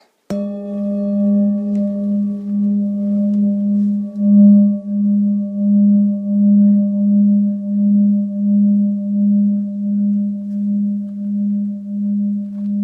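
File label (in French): bol bengale 8-900g Sol dièse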